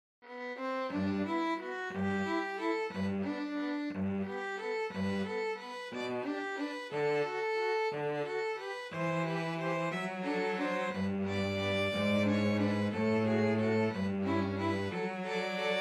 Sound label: 2 violins cello